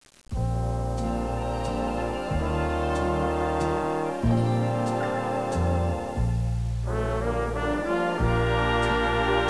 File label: Waltz